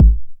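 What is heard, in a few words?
909 BD tape, kick drum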